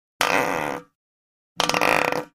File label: Lizard Tokay Gecko